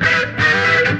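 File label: Guitar Funk; Sonic Foundry (Sony Creative Software); Ugly Remnants VOL-2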